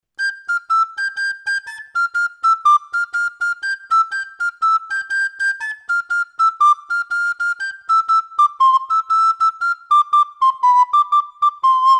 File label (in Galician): trad, Pito Galego